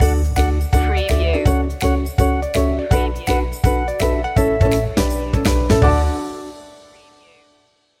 happy explainer stinger

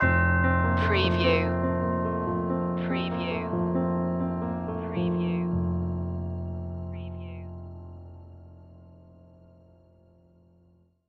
sailing to the end stinger

